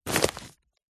bag sports small pick up; zapsplat

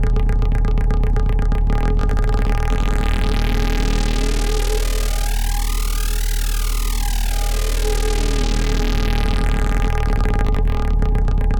Moto Synth Loop; martyn stonehouse

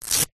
sport shin guard soccer velcro rip

